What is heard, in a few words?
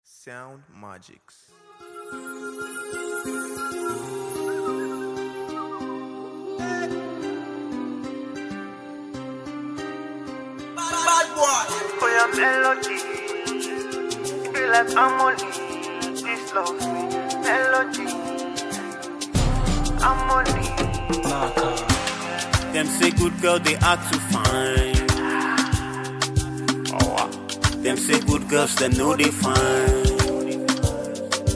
Melody